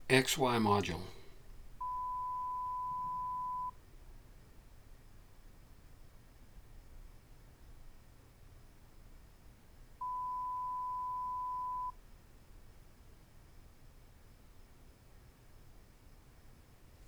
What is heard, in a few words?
noise XY Rode